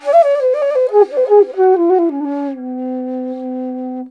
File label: INDIA ALTO; Spectrasonics; Heart of Asia; IND ALTO PH